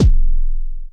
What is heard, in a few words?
kicks, Bass Drum